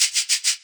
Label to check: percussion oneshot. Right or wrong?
Right